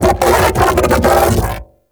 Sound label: Mech Ambient Vocal; Warframe; Vehicles; Thano Mech